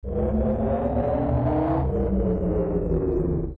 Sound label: Jabu Jabu Breathe